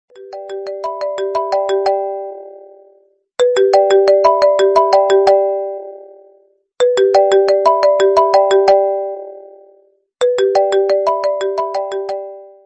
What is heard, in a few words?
Cool iphone ringtone